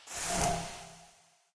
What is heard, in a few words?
brush; smite; zhongkui